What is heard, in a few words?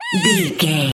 voice fun ant creature